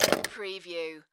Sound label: Pinball sound, mechanical movements